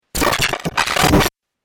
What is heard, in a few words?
Crazy Glitch 8Bit Style; Video Game